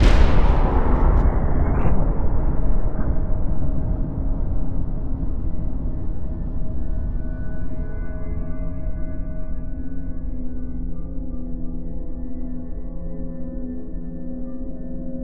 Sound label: sting, Cinematic Metal Impacts; boom library